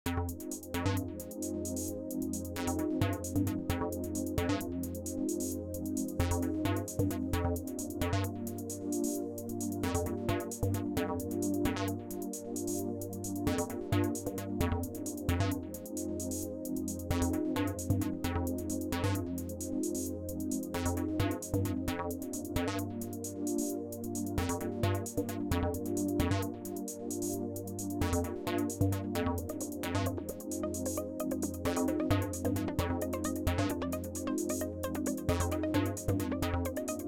DT, 101, Norand Mono & Polysix VST, norand mono bassline